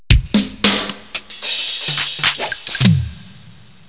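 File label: percussion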